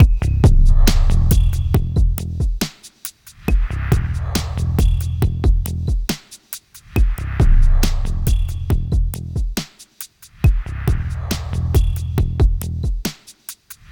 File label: Darkwave, Processed Percussion